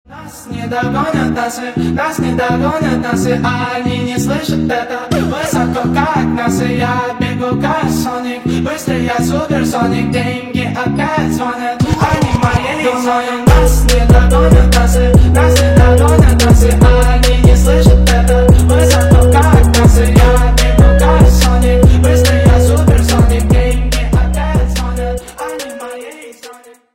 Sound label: speed up